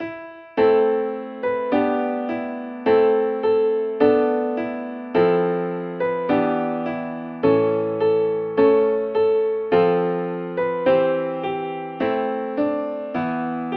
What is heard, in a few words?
accomp; hymnary